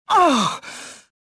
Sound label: choke; mothma